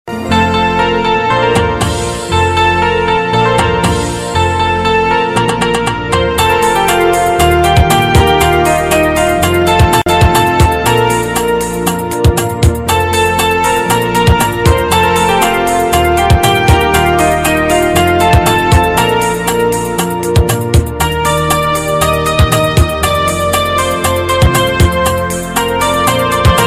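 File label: Old Superhit Instrumental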